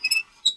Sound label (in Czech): vrz; Mafie 1